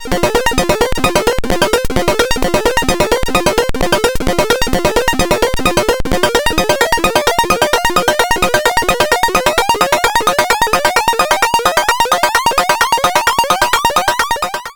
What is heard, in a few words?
Discrete VCO Sync CV EXP FM; ACL Multi Function